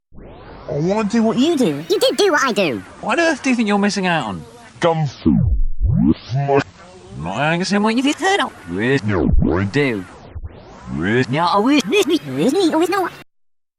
Assimil8tor Shuttling

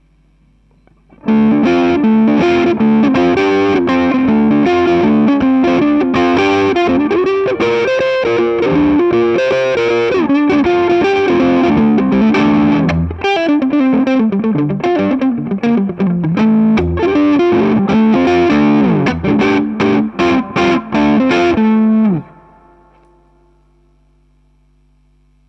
Dirty Blues

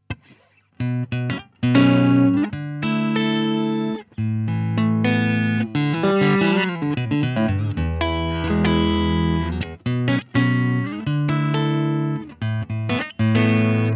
strat clean; Class 5

